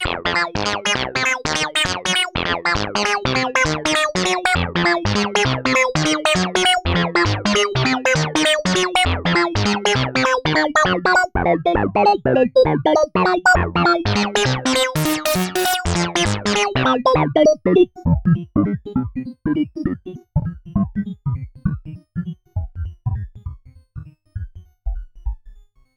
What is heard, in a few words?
DSI Mopho Keyboard Voc Arp